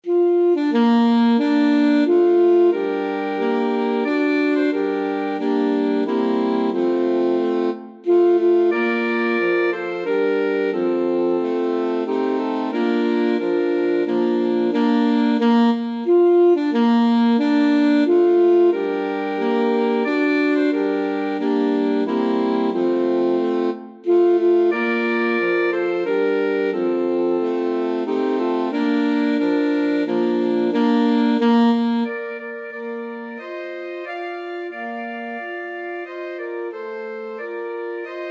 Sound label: star spangled banner, alto sax quartet